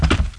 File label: gear; IGNITION